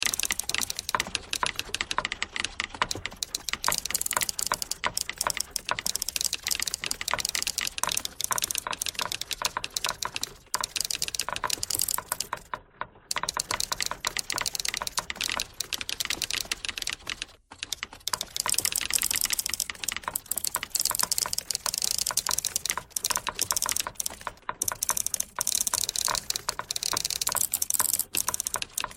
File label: typewriter thread pentatonic all